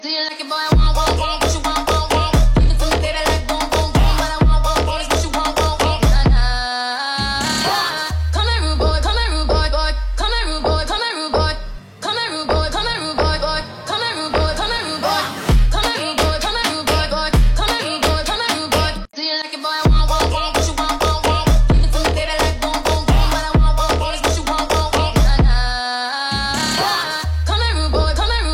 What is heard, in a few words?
Baile funk remix